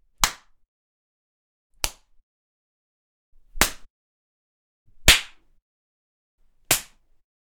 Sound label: Slapping